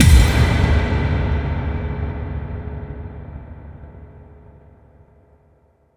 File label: FX Kicks